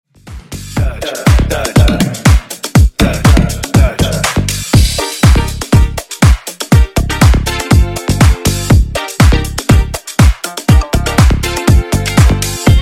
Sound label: Funk 121 Bpm